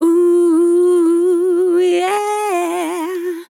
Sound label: Vocal Fills 120bpm Fm, oh yeah